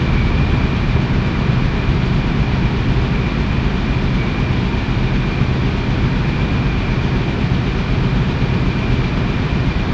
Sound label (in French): usine